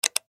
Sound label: Button Switch; Multimedia Web Interactive